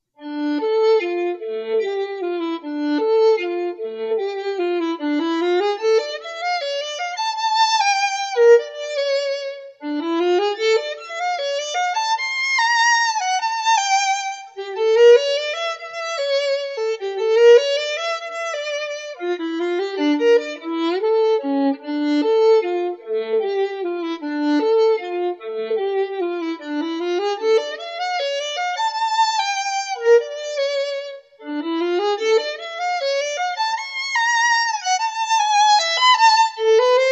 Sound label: score violin only